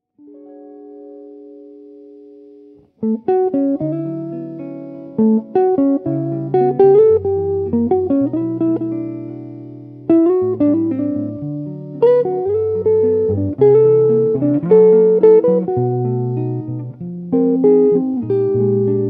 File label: Samba Swing